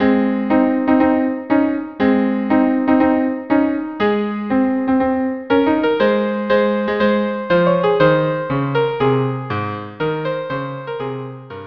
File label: viola cello duo; aida